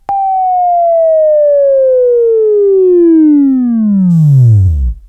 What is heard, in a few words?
Buzz, Sweeping Training